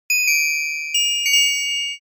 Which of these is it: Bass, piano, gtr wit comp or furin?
furin